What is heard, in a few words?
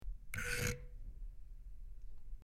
Dial Switch Metal